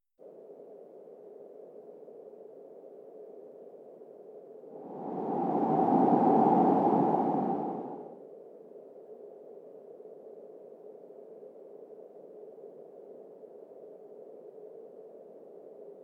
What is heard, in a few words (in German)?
Roehrenglocken